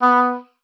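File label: B3, oboe d'amore; Xsample VOL-7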